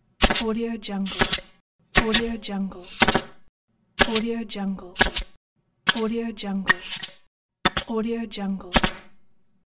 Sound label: nail gun